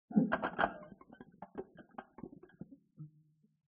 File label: compost debris; marie eve levasseur